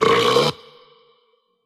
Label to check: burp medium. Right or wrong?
Right